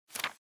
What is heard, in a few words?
Paper Open